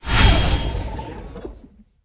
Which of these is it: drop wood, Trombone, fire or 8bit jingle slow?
fire